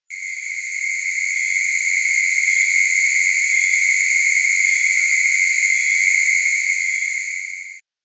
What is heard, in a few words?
cikada australian; sounds of the wild